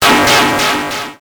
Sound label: Transition Hit Impact Warble; Adobe; Production Element